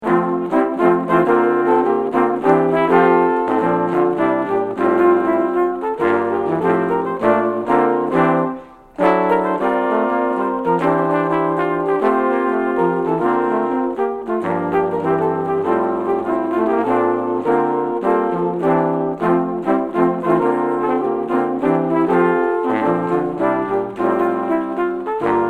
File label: dance of the trumpets; International Trumpet Guild